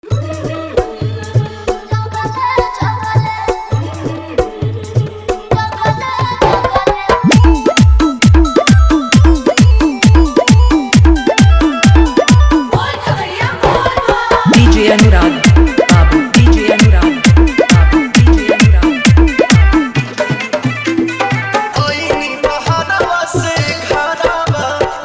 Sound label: Faadu Bolbam Mix